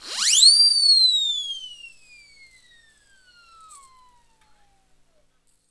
WHSLSIRE, Synclavier Percussion World & Orchestral; ILIO; Synclavier Percussion 2